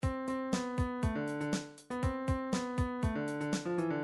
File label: funk, midi